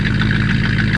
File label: new eng